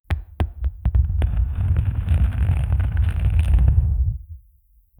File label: in the game a stone